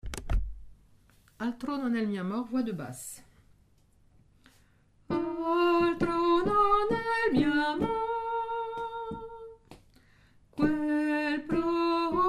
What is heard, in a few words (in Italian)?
hommes; chorale